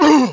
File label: MINOR PAIN; cash